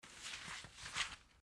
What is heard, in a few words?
Cloth movment